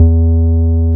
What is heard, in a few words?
BAS MKS-50, Roland